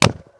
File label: Realistic Punch